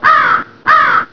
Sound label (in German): Kraehenruf